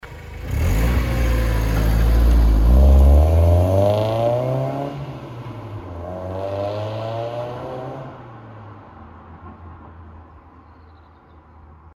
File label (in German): 4Zyl Losfahren